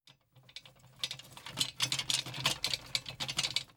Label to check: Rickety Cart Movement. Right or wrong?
Right